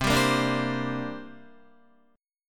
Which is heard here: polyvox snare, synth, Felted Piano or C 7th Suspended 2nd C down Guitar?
C 7th Suspended 2nd C down Guitar